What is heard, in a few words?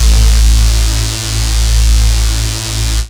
saw lead, synth notes; Techno Trance Essentials